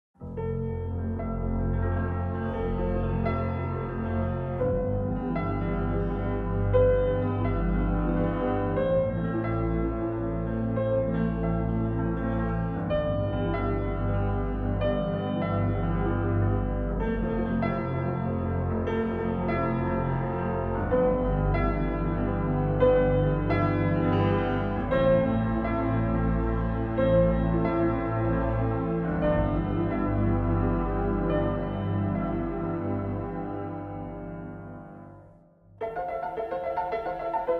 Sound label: piano cover; Interstellar